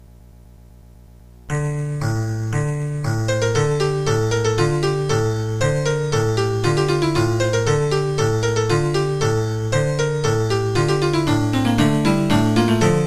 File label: Folk Dance